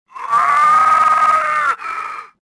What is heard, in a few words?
death